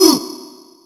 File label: Yamaha Uh, Percussion Hits; Drum Hits; Nu Dance Electro